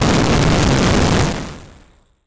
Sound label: Collapse; RPG Maker; mkxp-z; RTP; RPGVXAce